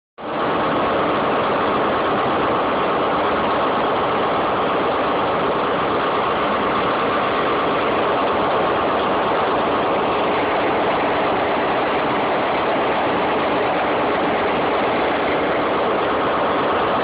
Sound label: British Rail Class 43 HST